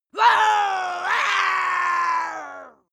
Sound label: witch yell angry